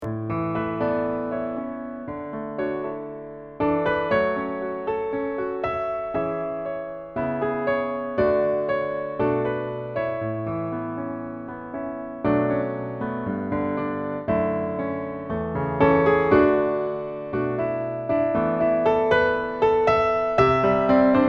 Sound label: accomp